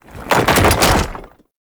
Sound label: Concrete Archgun Charged React; Warframe; Archwing